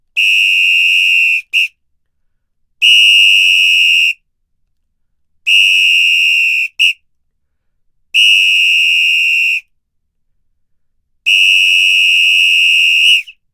WHISTLE SAMABA SINGLE LONG NOTES VARIOUS STRENGTHS LENGTHS; The Chris Alan; Musical Oddities; Bells, Whistles, Horns, Percussion and More; GDC 2018; Game Audio Bundle